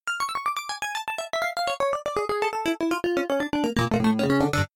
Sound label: synthpiano